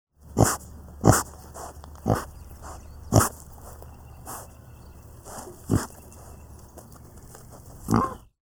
Pig Snorting

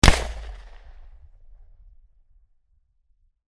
308 Bolt Action Rifle Single Close

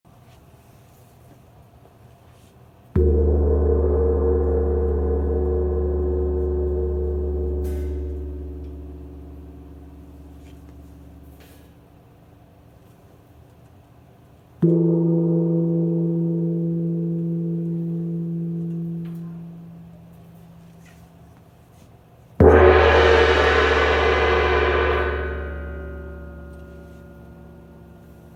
Tam Tam

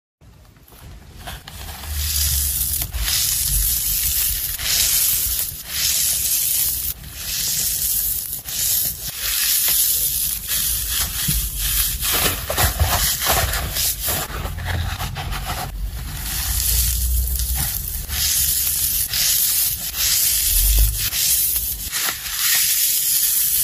sponge; اسفنج خیس

